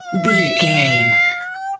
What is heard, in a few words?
cat meow long